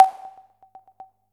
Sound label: spinner bonus; Crimson Stella